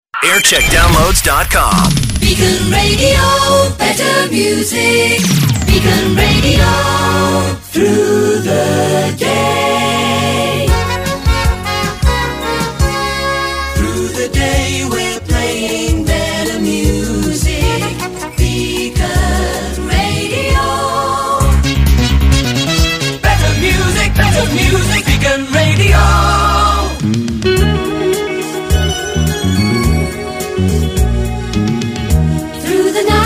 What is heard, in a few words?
jingles; beacon; 1985